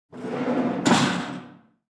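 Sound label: iron grate open; doors; FEAR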